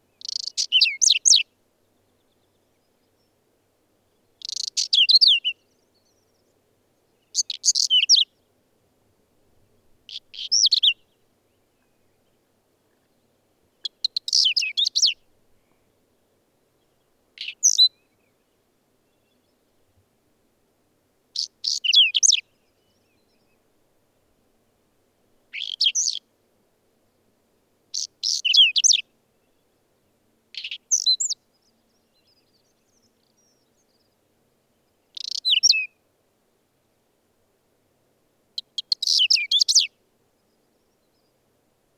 Braunkehlchen; im Gebirge; Das Braunkehlchen ist ein Zugvogel; es ist daher nur während den Sommermonaten in Mitteleuropa zu beobachten.

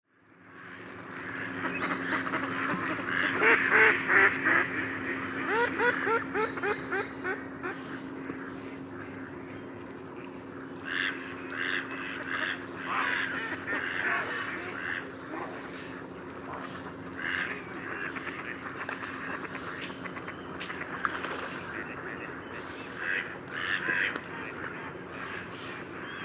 Stockente; Matthias Feuersenger; xeno-canto

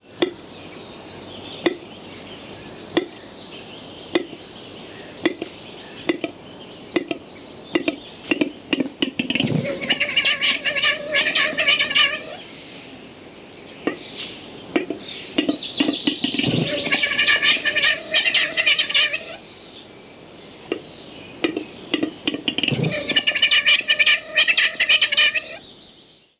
Auerhuhn; Auerhühner fühlen sich in lichten, ungestörten, nadelbaumreichen Wäldern zu Hause.

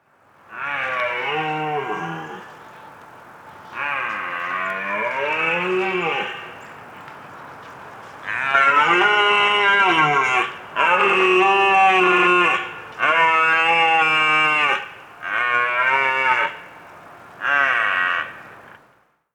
Rothirsch

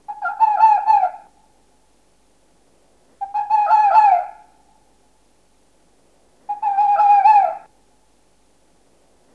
rotfuchs bellstrophe einer faehe; Sommer

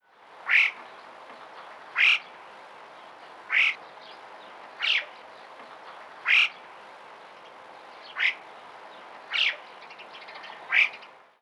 alpendohle; Bergdohlen; im Gebirge; Bergdohlen können ausgezeichnet fliegen und sind fast überall in den Alpen zu beobachten.

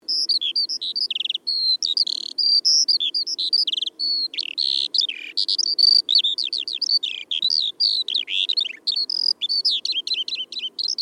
Feldlerche

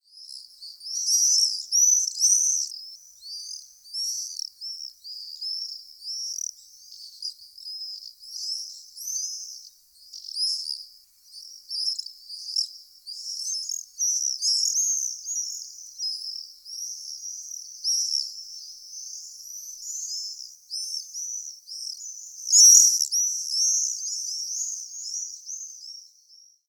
mauersegler gruppe flugrufe; Stadt

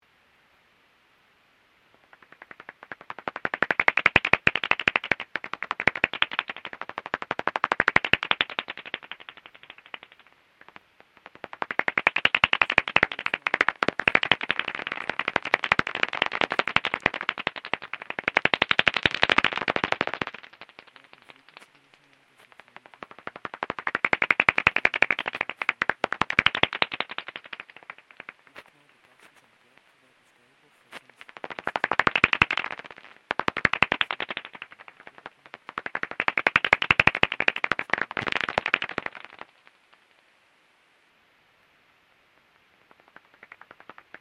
Zwergfledermaus

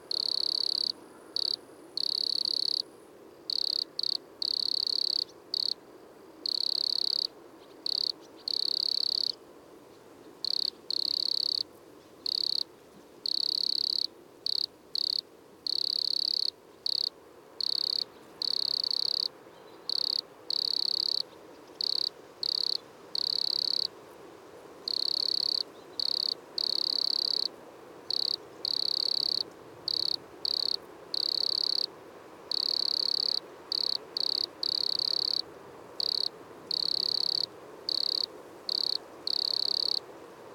Waldgrille; Die Waldgrille erzeugt ihr typisches Zirpen; indem sie die Schrillkante des einen Flügels über die Schrillleiste des an

